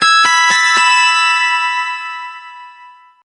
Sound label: Alarm Early Riser, BlackBerry; BlackBerry 9000-Bold